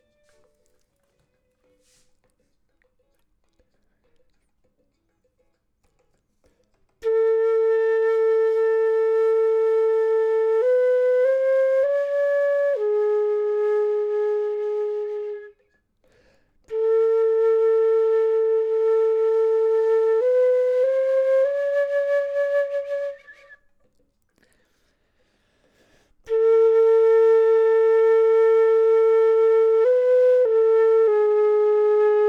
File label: flute; logic